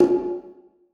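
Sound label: conga open, Percussion; Sonatina Symphonic Orchestra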